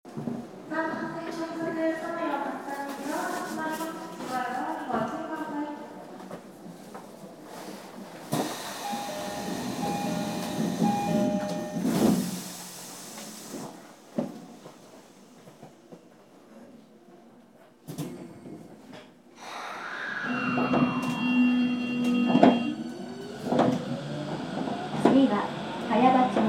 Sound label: vvvf, mitsubishi